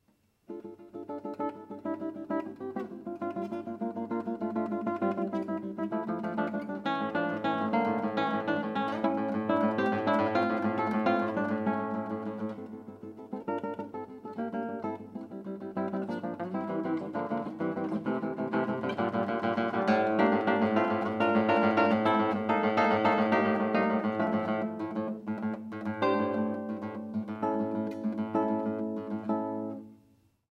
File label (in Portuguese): Acordes repetidos